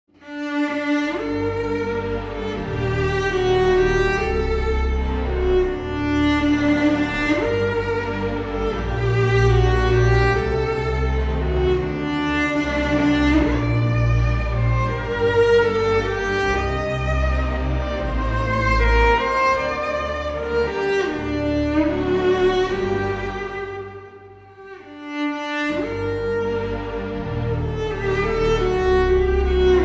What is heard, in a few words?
Nina (Cello + Orchester)